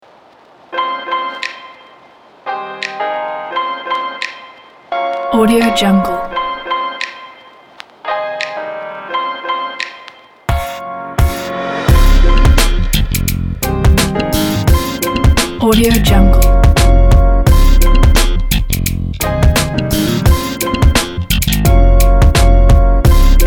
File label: stylish funky summer hiphop; acewhale